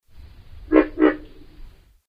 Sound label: Pig Frog Everglades NP